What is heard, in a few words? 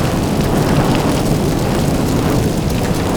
firefly loop; weapons; dota; batrider